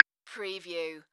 Multimedia Percussion

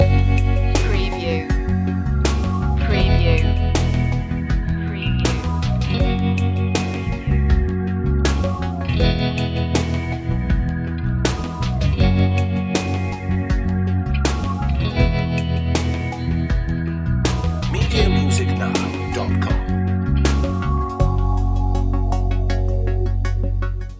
ambient dream loop

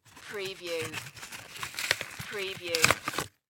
Package Remove Item

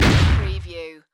Explosion Small; Action, Impacts & Explosions

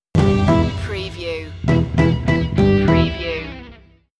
stinger

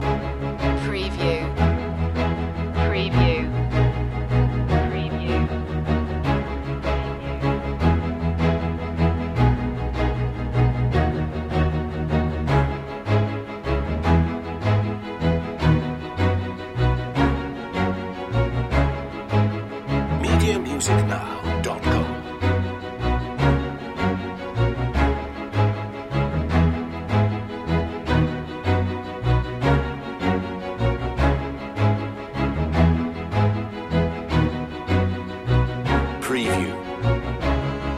forest sprint underscore loop

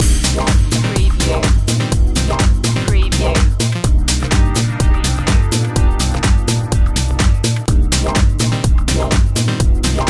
pulse motion loop

